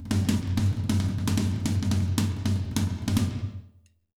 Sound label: BEAT, Jazz Latin Drumloops